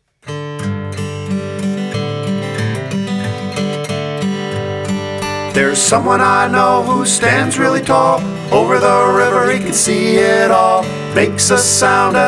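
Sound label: Big Ben 2, Piano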